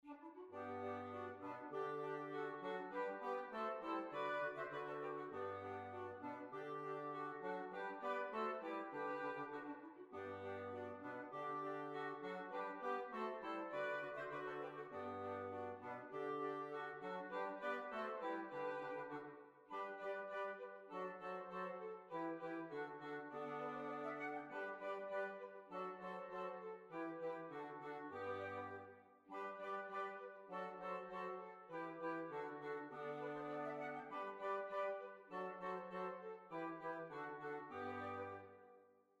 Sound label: French Quadrille